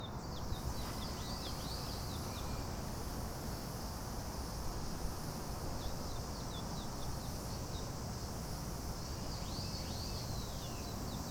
Ambience; Kiwiliand; Super Kiwi 64

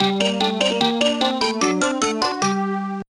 Jingle Level Complete

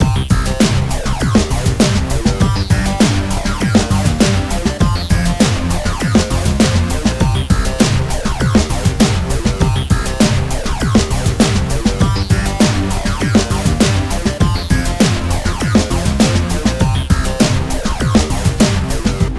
Card Menu BGM; brooktown high; playstation portable psp

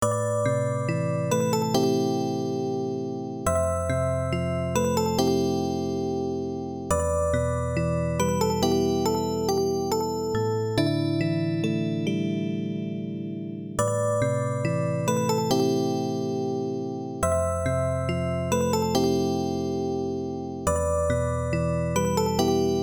Theme (Musicbox Version); Dennou Club Collection; X68000

